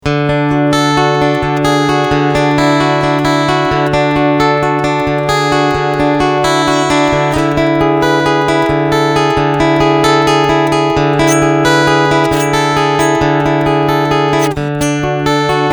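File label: Piezo Picking, guitar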